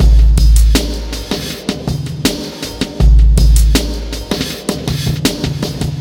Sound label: Grungy Beat, ambient; ambient vol 2